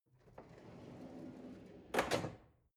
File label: drawer narrow close